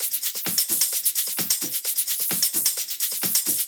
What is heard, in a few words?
Live Percussion, 130 BPM; Sonic Foundry (Sony Creative Software); Underground UK House & Electro